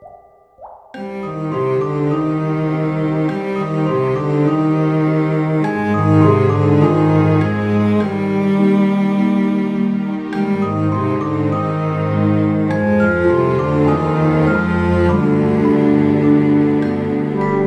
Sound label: Background Main; Curse of the Sea Rats